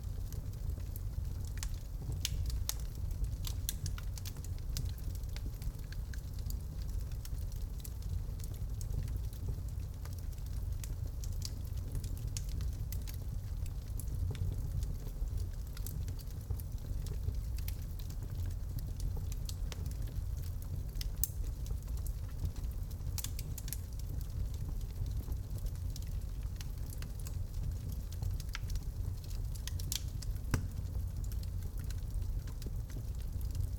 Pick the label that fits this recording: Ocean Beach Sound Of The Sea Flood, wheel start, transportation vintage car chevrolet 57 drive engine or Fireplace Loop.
Fireplace Loop